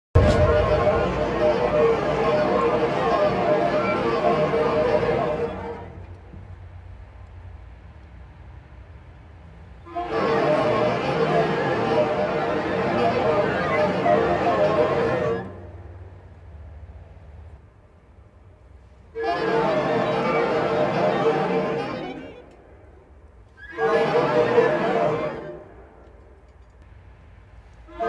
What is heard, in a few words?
bees and car horns, brass band; 350 brass band musicians, 2006